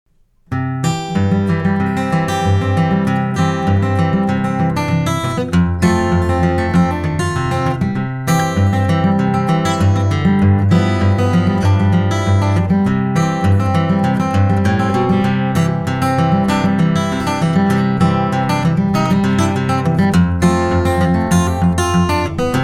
piedmont blues

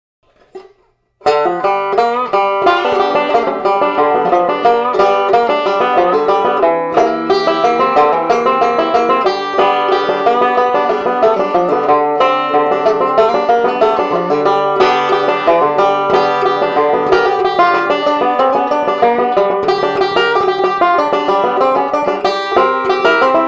swamp blues

